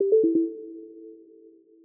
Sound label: critical error; Linux; Celestial 2.1